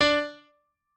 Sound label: piano